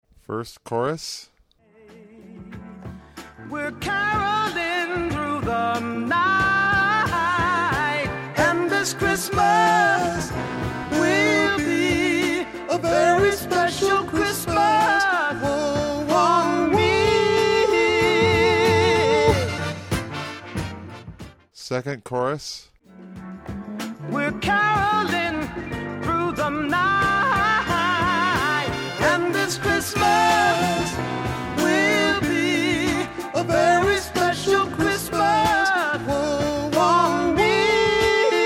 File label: Alto